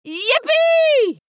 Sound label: Yupee